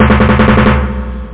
tymp roll

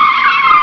ski; NewSurfs

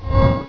Teleport; Bugs Bannis; Squish 2.6.8

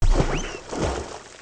walrus; The Interactive Alphabet

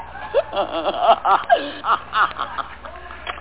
windows; EXORCIST; dopus

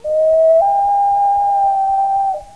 Loon Wail; Bird; Hyper Stacks

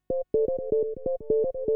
SYNTH PROGR 125; Keys

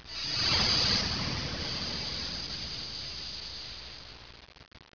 Creepy; WARLOCKSNDS

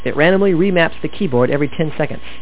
Keyboard

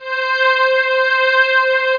Synth String C5; Star Trek JR Audio

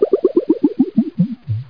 Down Space Ship

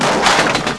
Crunch; Demo Man

